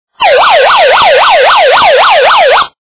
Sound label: alarm siren